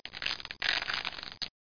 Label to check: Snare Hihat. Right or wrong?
Wrong